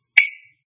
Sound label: tick